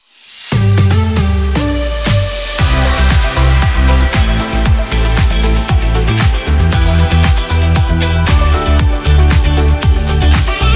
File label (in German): Long ohne SV